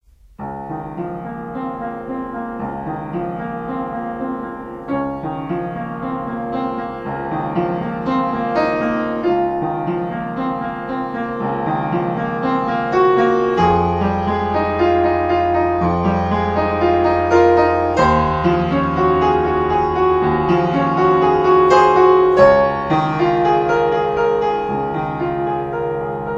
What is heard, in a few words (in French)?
PRELUDE 21 EN RE BEMOL MAJEUR